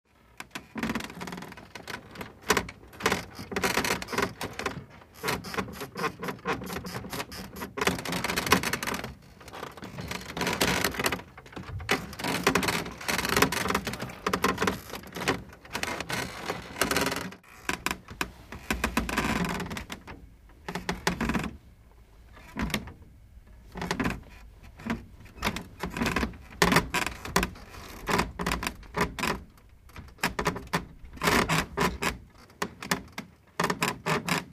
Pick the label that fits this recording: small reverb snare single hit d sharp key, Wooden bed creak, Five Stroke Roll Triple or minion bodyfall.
Wooden bed creak